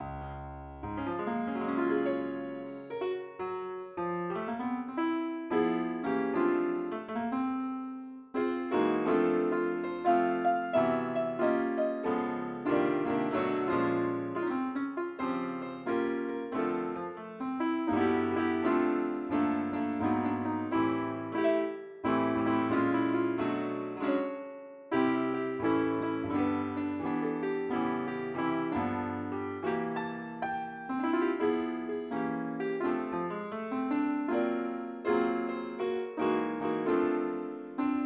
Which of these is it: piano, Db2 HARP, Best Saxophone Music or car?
piano